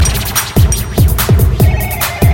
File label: Bass beat short